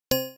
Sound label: Self Muted Deafened; Mumble